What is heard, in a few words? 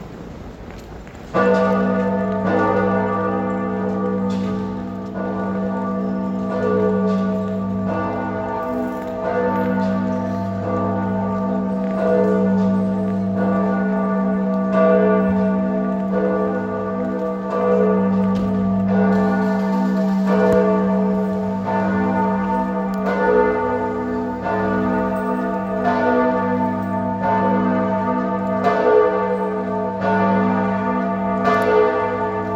Soundscape